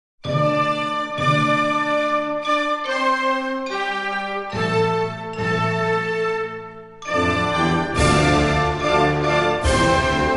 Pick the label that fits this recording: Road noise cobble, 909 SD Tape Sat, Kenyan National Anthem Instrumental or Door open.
Kenyan National Anthem Instrumental